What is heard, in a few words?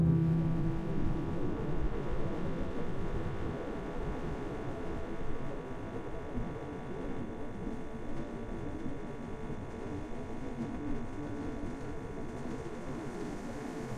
ambient nature